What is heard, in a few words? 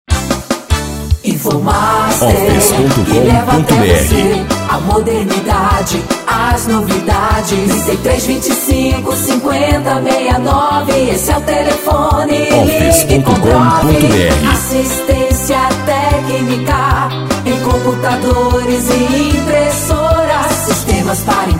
jingle